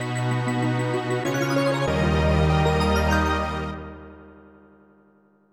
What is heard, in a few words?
Elemental Stone Acquired (Short); bomberman 64 second attack